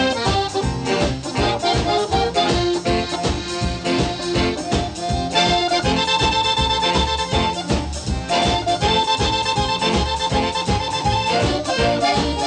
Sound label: blues